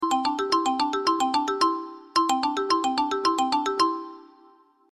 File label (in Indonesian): nada dering samsung Galaxy Note SMS